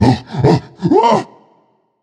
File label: nv fear, vox; smite; voicepack; vulcan